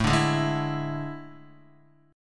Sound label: A Major 7th Suspended 4th, Guitar; AM7sus4; strummed; JGuitar